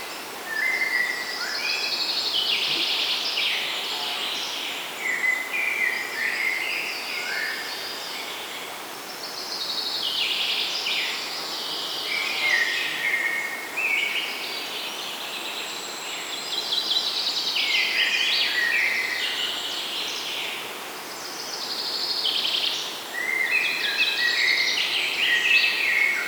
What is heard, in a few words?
Forest Ambience; Luca Francini